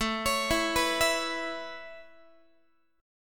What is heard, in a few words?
A-Major Add 9th Guitar, Aadd9 chord; Aadd9 arpeggiated; JGuitar